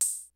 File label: DANCE KIT, DRUMS; Kid Nepro; Elite